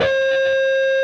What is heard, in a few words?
PRS E 2D, PINCH Guitars; Spectrasonics; Hans Zimmer Guitars VOL-1